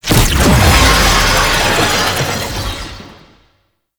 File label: Clone Spawn; Boss; Enemies; Abilities; Warframe; Oraxia